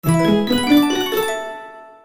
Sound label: Win